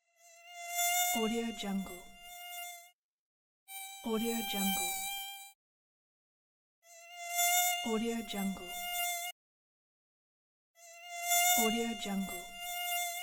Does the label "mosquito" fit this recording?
Yes